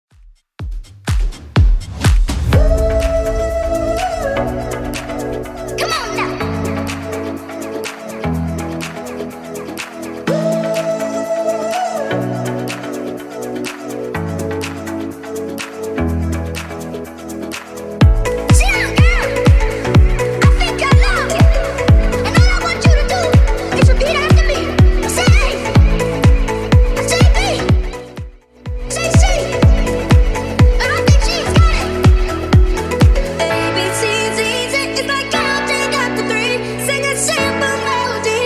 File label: 124bpm